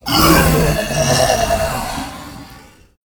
Chromatic Prototype Death

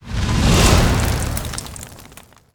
Wildfire Fire Boosh

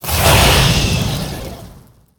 Serpent Ward Attack

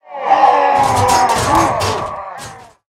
Crossroads Watch Post Attack

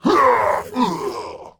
Runeforge Haunter Death

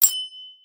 Rat Underlay Play

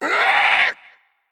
Male Parrot Attack

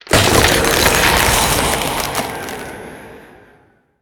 Ice Revenant Death

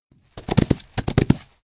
Four Hoof Small Play Underlay

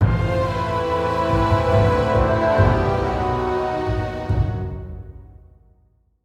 Stinger; Kurtras Demon Render

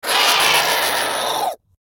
Raven Familiar Death

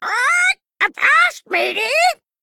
Male Parrot Play

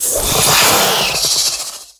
Dancing Cobra Attack

